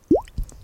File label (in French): Bulle